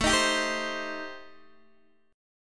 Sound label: A-Minor 7th Flat 5th, Guitar DADGAD, chord